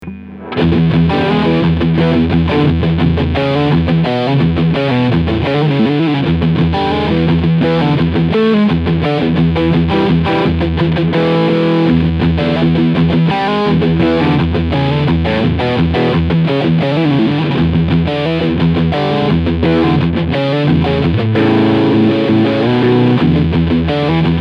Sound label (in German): MXR Dookie Drive Blend Mitte Scoop ON